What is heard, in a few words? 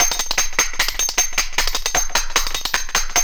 CHINA SPOON; Zero G - Ethnic; CHINA SPOONS